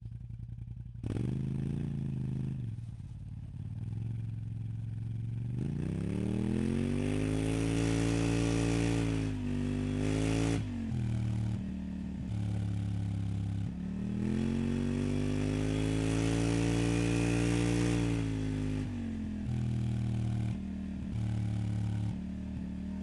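polaris scrambler onboard gearshifts drive exhaust left; dpa4062; Sonniss GDC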